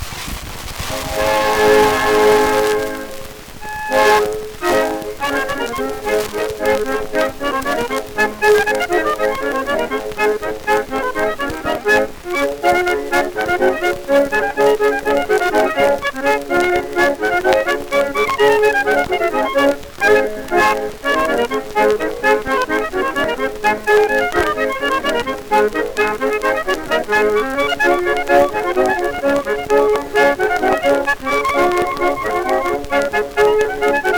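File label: Orkestr, orchestra; Sirena; Soloveva Brueva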